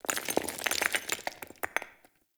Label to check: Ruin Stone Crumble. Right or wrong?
Right